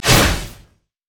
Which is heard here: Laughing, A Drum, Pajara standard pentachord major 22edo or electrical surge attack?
electrical surge attack